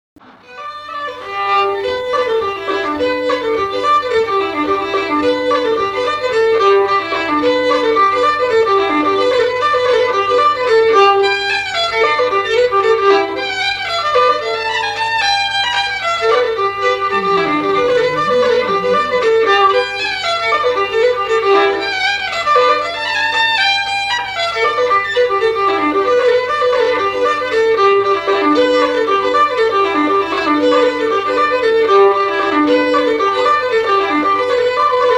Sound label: pitched up, Sliabh Luachra reels; Denis plays Donal a Phumpa (pitch adjusted)